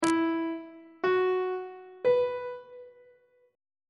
f flat suspended 2nd triad chord intervals on treble clef